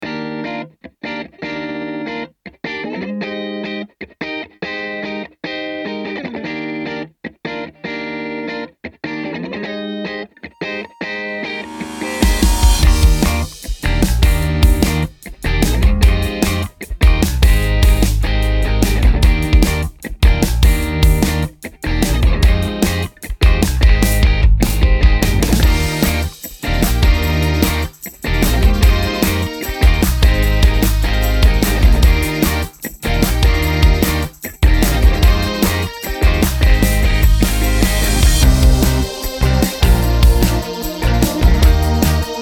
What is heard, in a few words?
HAPPY POPROCK Indie Rock